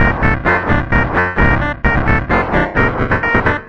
simple retro melody

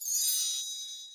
chime shot

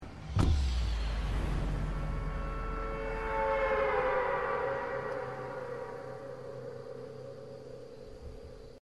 battlebus jump

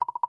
voice chat start; claude

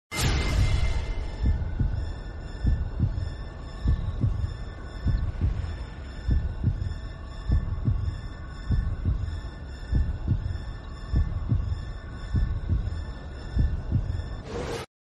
reflex mode; mgs

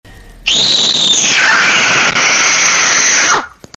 screaming rage

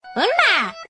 high note

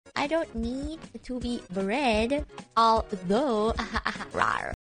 ahaha rawr

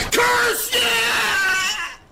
yaaagh; caseoh

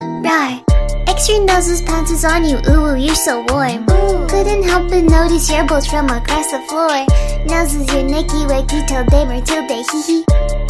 rawrrrr

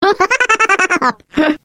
cackle; glados

